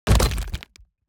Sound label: environment room damage stone